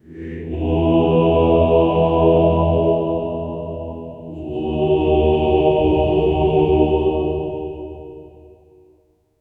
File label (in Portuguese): um coro ritualstico sombr; ancestral